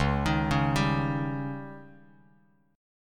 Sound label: Db Suspended 2nd Flat 5th, Bass Standard; arpeggiated